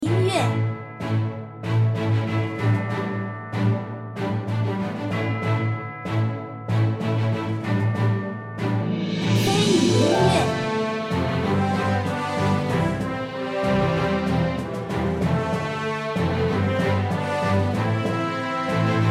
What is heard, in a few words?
bg area city